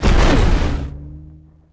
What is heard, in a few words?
rico hangar Laser; impacts explos